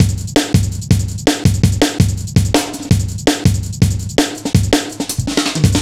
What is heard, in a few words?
165, Drum Loops, Drum & Bass